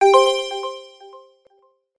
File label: app alert tone